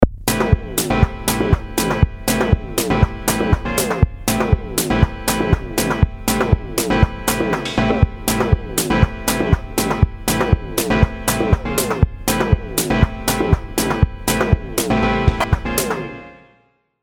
DrumComputer

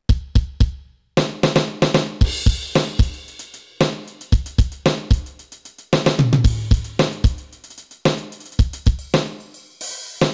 ALESIS Debut Kit

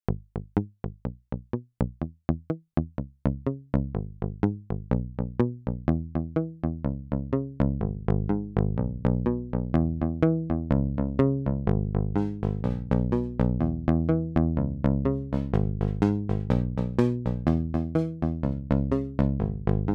Intellijel Plonk DX Bass

